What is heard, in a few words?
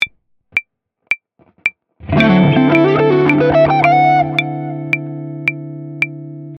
Lick